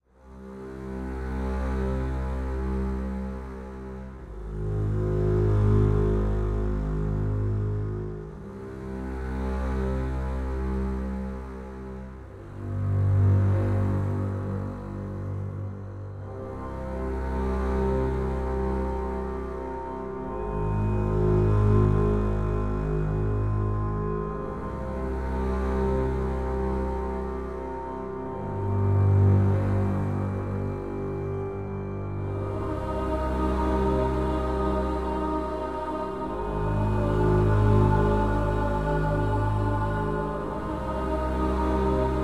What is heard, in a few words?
underground, music; wesnoth